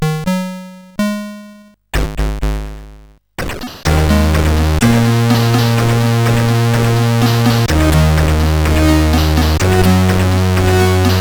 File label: sid, CSG8580R5; Tenebrous Party